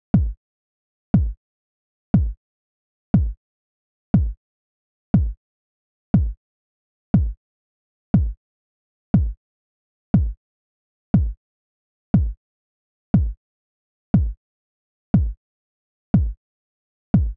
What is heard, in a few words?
Kick; hydrogen